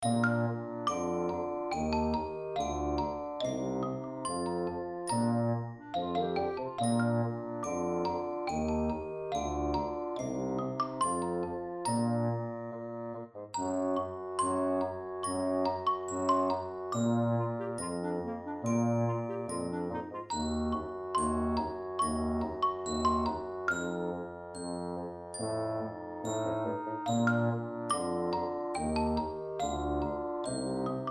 children music

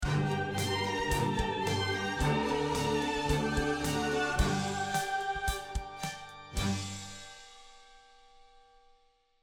Dramatic Opera End; Dramatic, majestic; Mood: Dramatic; Sopran; BPM: 110

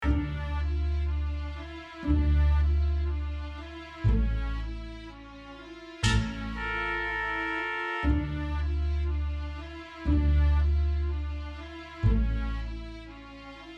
Tension; Modern Classical

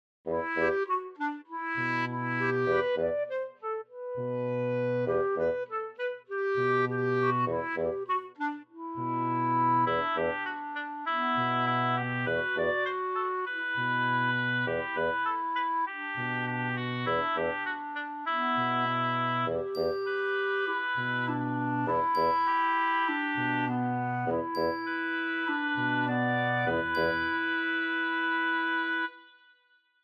Childrens music

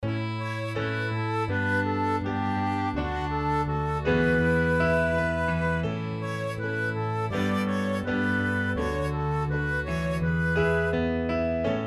Childrens music

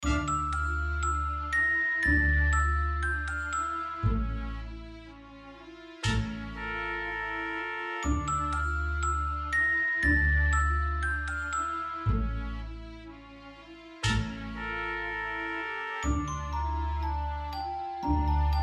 Tension

